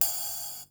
short reverb crash cymbal d sharp key; drum sample; single hit; one shot

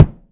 raw kick drum e key; kick drum samples

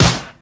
airy sizzle hip hop acoustic snare sample b key

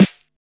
rich low end snare drum sound g key; snare drum samples

